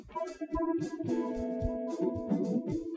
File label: Latino